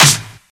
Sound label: Steel Snare Drum Sound A Key, snare sound tuned to the A note; snare-drum-samples